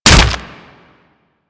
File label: Arm Attack Hit; Warframe; Infested; Ancient; Attacks